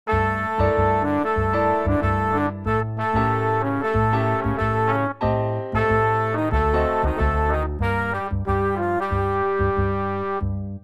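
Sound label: minor-key agressive bossa; first chorus of exchanges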